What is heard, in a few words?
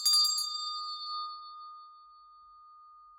Zen Chime; PomoLuxe